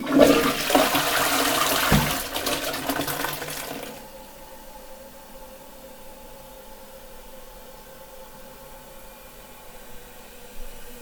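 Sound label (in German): Klospülung; Pfugstrasse 16 Schhlieren; Antognazza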